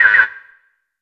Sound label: SYN FX, PERCUSSION; AKAI S6000 CD-ROM - Volume 5